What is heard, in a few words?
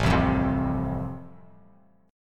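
A Minor Sharp 5th, chord strum; Am#5 strummed; JGuitar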